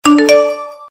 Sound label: Iphone tri tone